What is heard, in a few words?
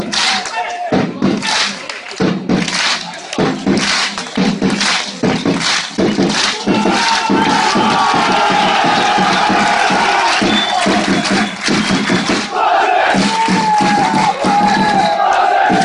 clap